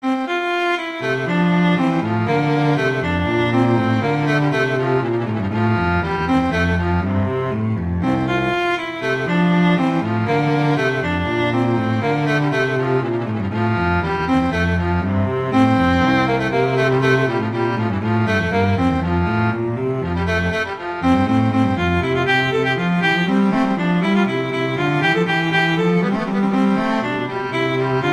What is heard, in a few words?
Allegro; Telemann; Canonic; Vla Vc; Sonata 1